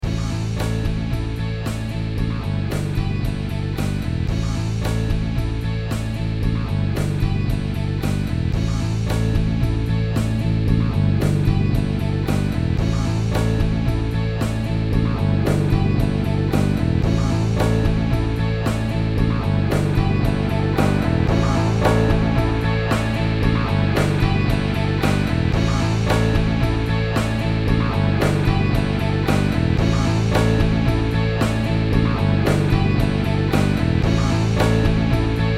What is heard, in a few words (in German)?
Song Mono EQ Alle Bänder Boost; GreatRiver; Harrison32